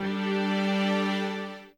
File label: chord